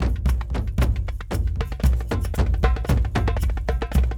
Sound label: Kendong, World Percussion; Brekete, Shaker, Dumbek